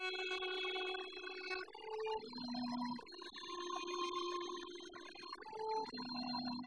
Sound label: Castanets Violin Applause